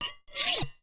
knife large blade scrape hard surface or other blade